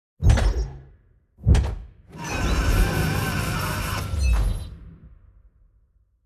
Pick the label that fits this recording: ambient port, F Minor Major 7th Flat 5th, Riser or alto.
ambient port